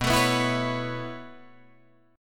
B 7th Suspended 2nd Suspended 4th down Guitar Drop D, chord